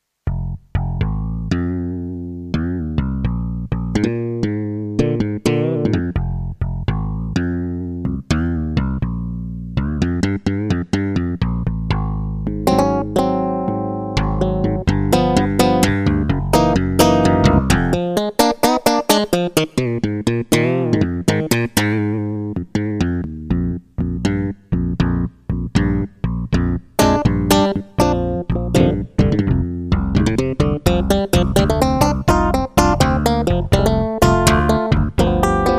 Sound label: superbass